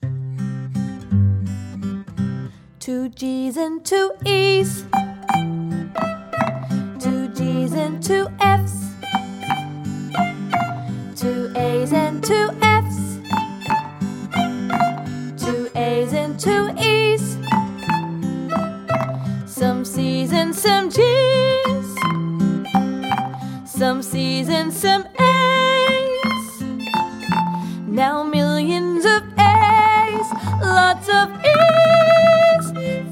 Blue Danube Waltz Vocal

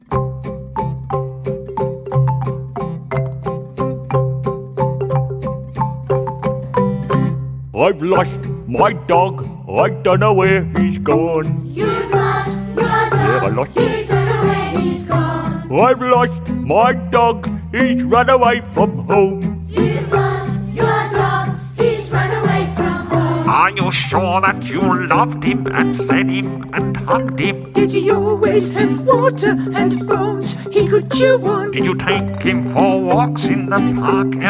Lost My Dog Vocal

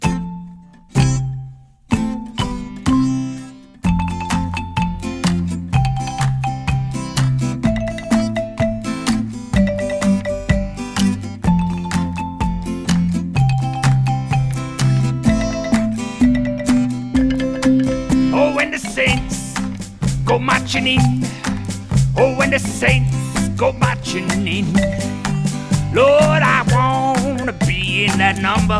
The Marimba March Vocal

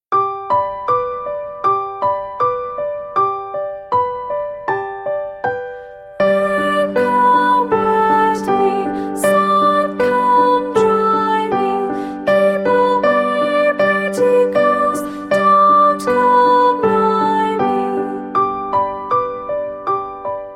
Rain Come Wet Me vocal

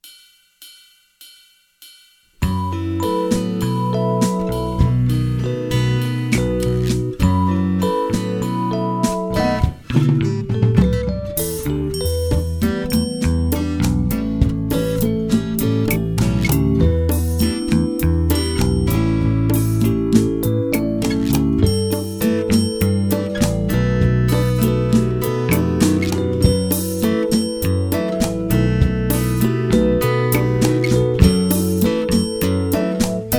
Bossanova

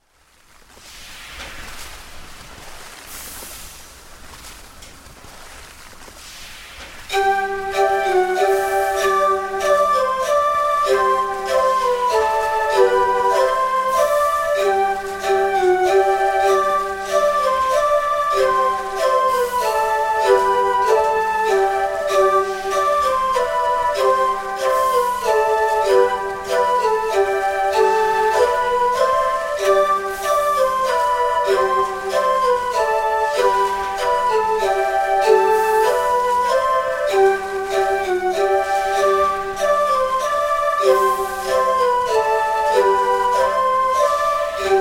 Calliope

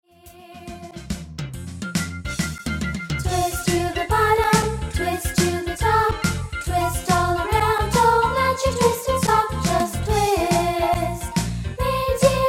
Vocal

